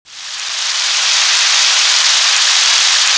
Rain; Zelda; MC

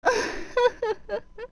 Great Fairy Laugh

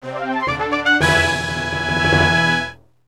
Fanfare Pearl; Get a Goddesses' Pearl

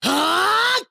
Attack

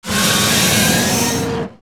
Volvagia Scream Battle; Zelda; OOT

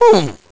Hmm; Ezlo: "Hmm."

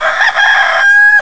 Cucco

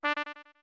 Notes Pipes D short; Deku Pipes (short)

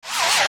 Torch Slug Hiss; OOT

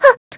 Ledge Near; Zelda nearly walking off a ledge

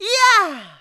Cheer; Oren; zelda

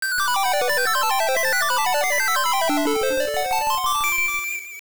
Get Sword; Get a Sword

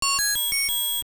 Key Appear, A key appears; LOZFDS

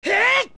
Strong Attack; Link's strong attack shouts (spin attack or jump-slash); Adult Link

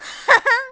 Laugh; Zelda laughing at Ganondorf (cutscene before the final battle); zelda sounds

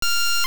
Magnetic Gloves Half Second; Magnetic Gloves (sample sounds, about half a second and one second long); Zelda Oracle

